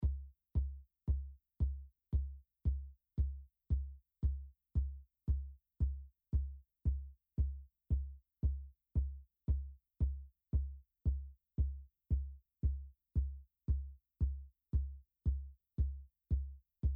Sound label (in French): Kick